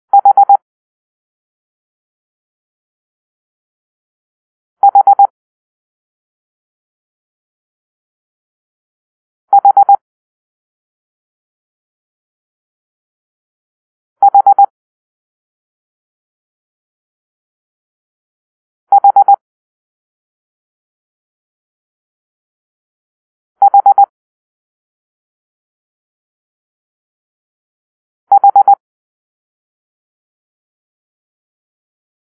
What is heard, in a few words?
Hotel (H), morsecode